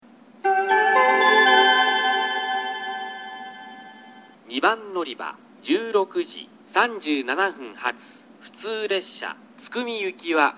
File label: oita local tsukumi; 案内放送（津久見行き）